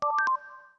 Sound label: wheel tap, Keytone DTMF; Samsung SGH-i450v